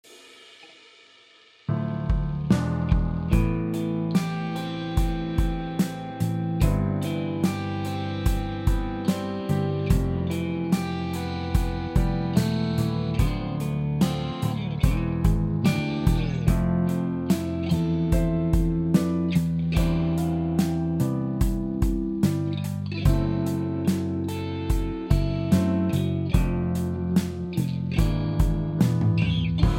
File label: ballade bass